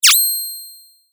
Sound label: sword